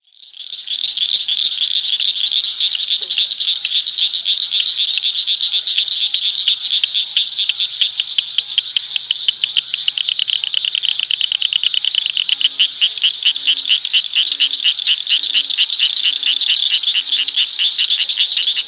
frog, Call cricket-like clicks, or as though two small pebbles were being chipped together; Acris blanchardi